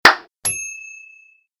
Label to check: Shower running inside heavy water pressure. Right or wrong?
Wrong